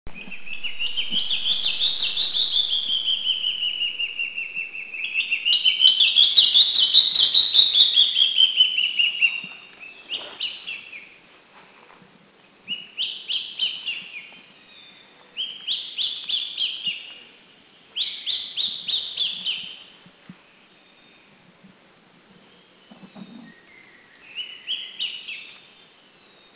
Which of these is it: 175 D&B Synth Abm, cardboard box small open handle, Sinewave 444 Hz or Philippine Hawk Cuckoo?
Philippine Hawk Cuckoo